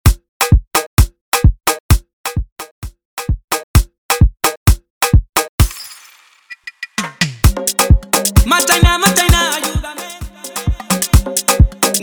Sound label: afro 130bpm